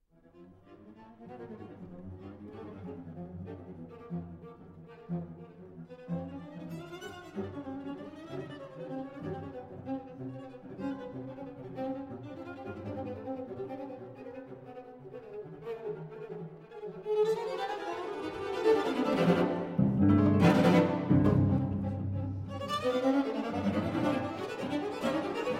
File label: String Quartet No. 4 in C Prestissimo, con sordino